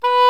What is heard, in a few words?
Oboe f; Roland L-CDX-03 Disk 1; Oboe 4-8